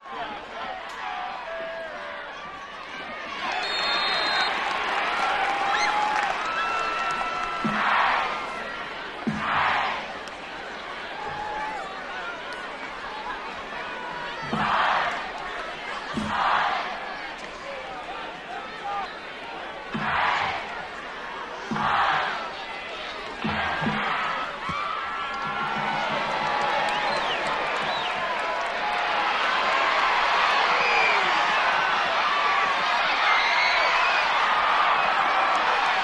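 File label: football cheers, chants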